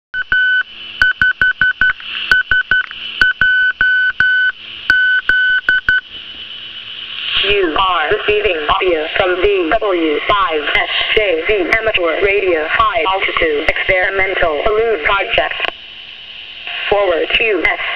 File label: beacon; N5LDI